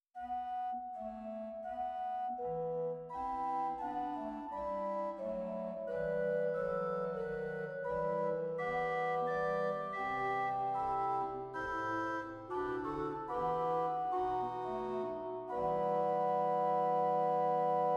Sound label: Rugwerk Quintadena 8; zwolle